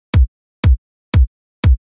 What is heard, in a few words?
house